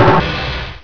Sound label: door stop